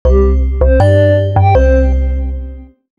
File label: Bells of Wonderment